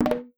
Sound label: Toxic Recv Message